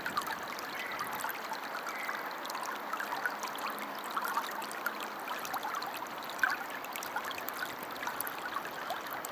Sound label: calmwater